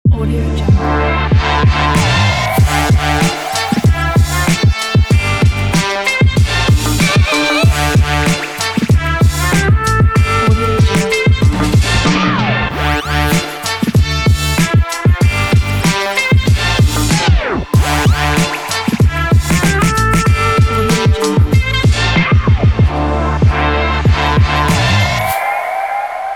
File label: hiphop opener